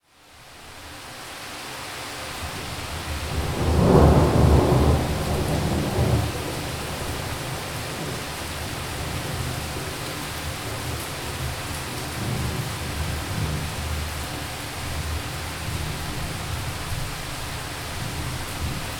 mountain thunder roll with heavy rain; soundscapes

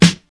rich low end snare drum sample f key

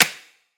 subtle reverb clap sound f sharp

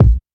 warm bass drum sample a sharp key; kick drum samples

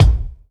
kick single hit d sharp key; kick drum; one shot

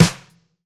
rich lows snare drum sample E key

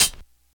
dark tone hihat g key

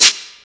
small reverb shaker hi hat f key; percussion

